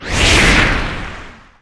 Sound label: Enemy Shellwizard Gimmick Disappear